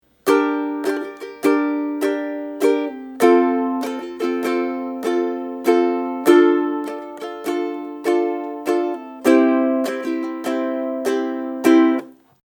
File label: Intro Strum, ukulele